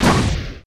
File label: Shockwave; Super Smash Bros